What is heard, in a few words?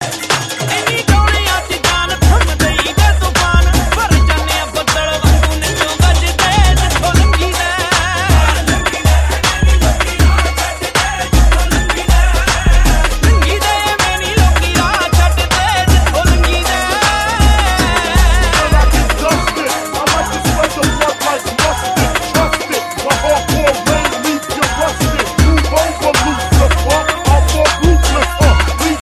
punjabi ringtone